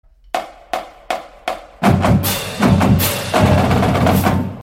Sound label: Fight Song